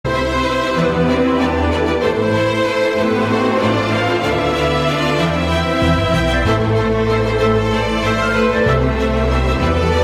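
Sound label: Instrumental background music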